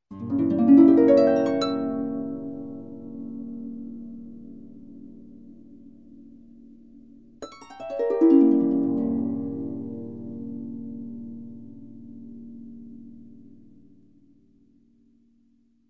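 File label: harp sound seventh chord arpeggio up and down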